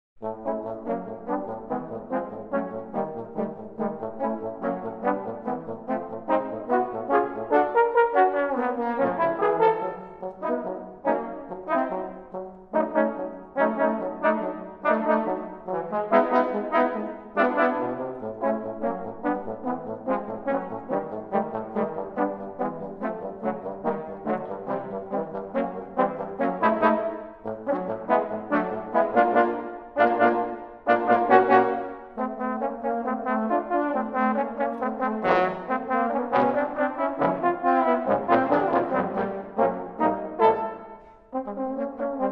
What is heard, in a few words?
suite for trombone quartet; 2012 senior musical comp; mvt ii